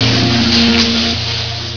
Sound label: ELECTRIC